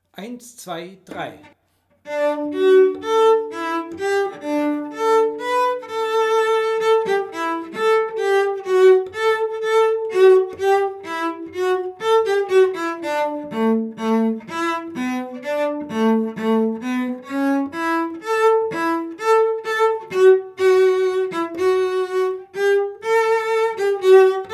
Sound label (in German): Stimme C; Reimlingen; Respighi; Satz 3